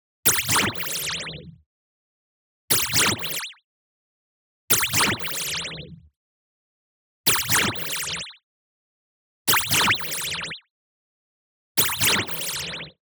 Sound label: MOVEMENT FAST Warp Burst Shudder Long; boom library; Anime Essentials; DSGNMisc